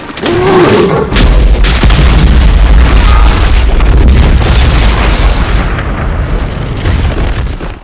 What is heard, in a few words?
wall blow; nippersounds; helms